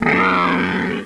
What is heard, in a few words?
voltigore pain; svencoop